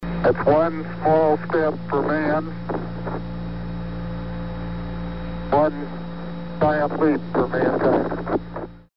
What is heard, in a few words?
sounds of mars; one small step earth